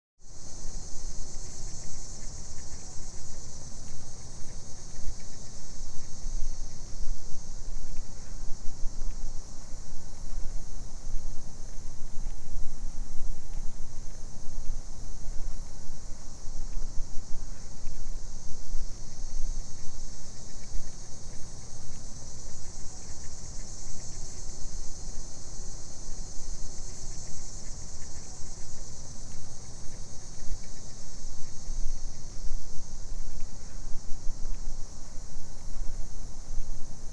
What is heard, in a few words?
cicadas on a quiet night